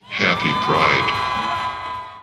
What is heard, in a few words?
crowd